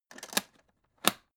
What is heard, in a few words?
alu case small close